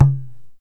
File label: tabla ghe; drums; one-shots